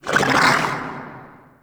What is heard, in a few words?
Venus Landscape Cave Creature Calls